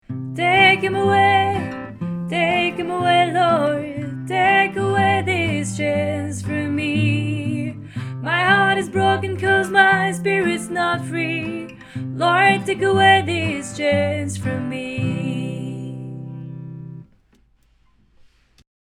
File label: lead